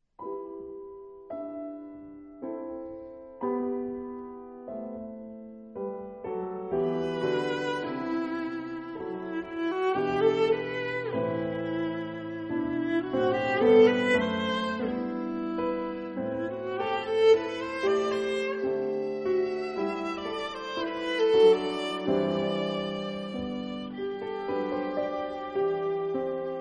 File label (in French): Soliloque alto piano; chambre